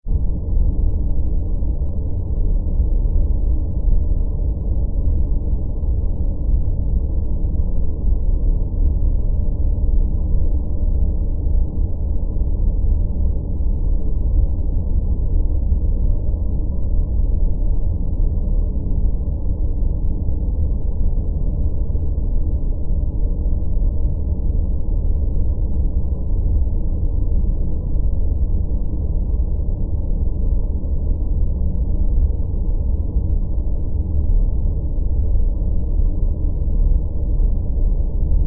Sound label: sound design drone dark; Sound Design - Dark Eerie Drone; Drones; McKinney Sound